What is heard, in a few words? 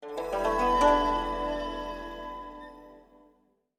jade Table end